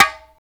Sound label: Conga Bongo; Roland L-CD701; PRC Latin 1